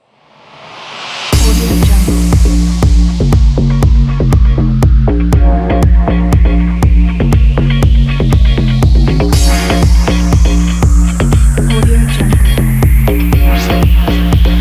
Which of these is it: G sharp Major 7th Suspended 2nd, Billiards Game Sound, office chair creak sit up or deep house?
deep house